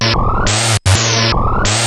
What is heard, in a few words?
TECH KIT, RAVE; Rubber Chicken Software Sample Bank